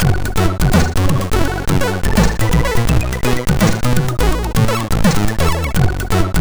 sid MOS6581R2

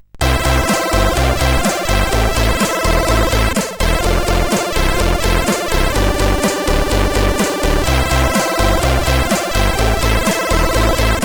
Shape Music, sid CSG8580R5

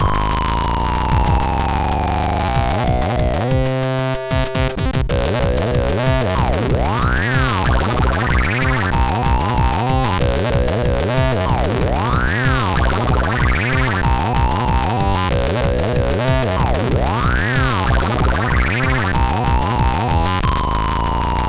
Tekkno, MOS6581R3

